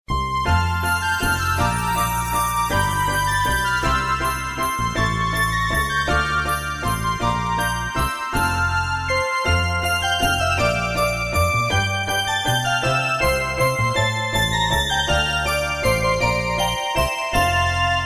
We Wish You A Merry Christmas, Instrumental Ringtone